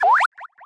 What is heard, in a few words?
JUMP